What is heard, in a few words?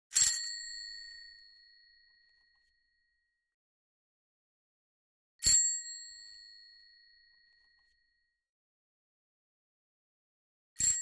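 Bicycle Bell Single Ring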